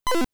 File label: Drop Item